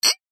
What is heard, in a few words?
household glasses drinking x2 clink together; zapsplat